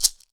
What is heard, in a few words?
MARACAS, Percussion